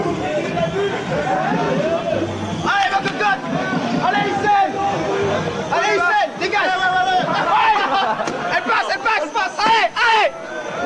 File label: Coff